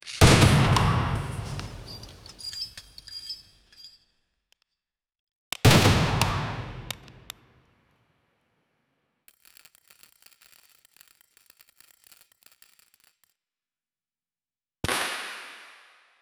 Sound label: futuristic winning sound, casino